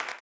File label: CLAP, Blues Soul; Spectrasonics Vocal Planet